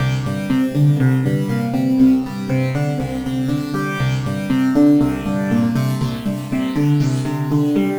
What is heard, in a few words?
Moding Synth, Synth Textures; Sonic Foundry (Sony Creative Software); The Electronic Music Manuscript Collection